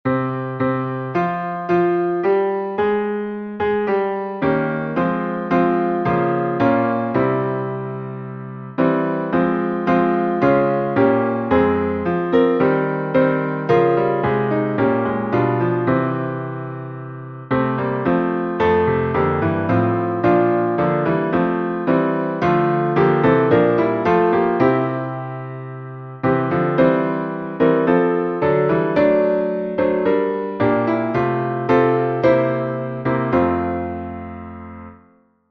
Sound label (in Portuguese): salmo instrumental